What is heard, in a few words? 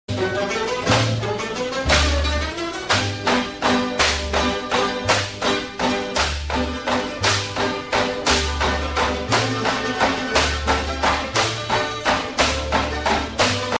Filipino Folk; MyPhone my96 DTV